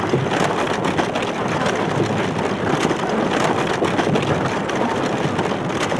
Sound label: War Chariot Loop; Civ4 warlords